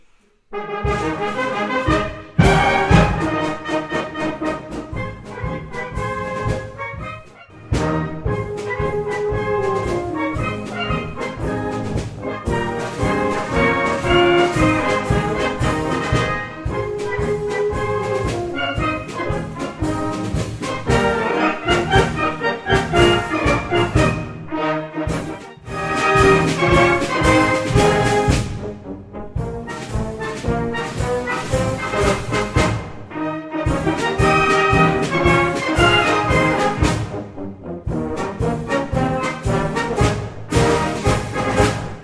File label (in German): Marsch